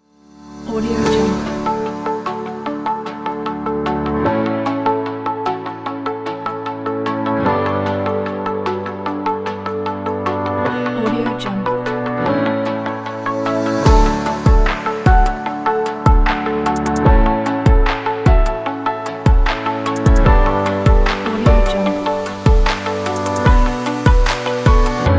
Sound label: happy piano corporate